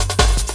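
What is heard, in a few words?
LOOP snare drum, Drum & Bass, 160 BPM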